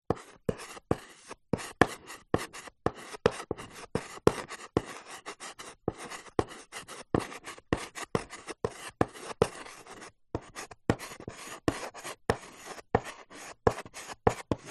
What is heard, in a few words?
office chalk stick thick write slowly on small chalk board